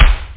solid kick; kicks

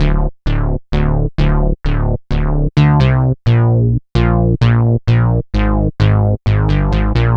BASS, 130 bpm

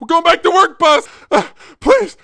get shot; Messiah

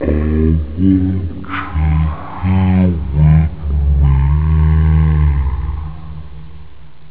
sing, speech; OctaMED SoundStudio V1

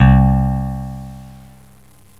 Fingered Bass; GMTones

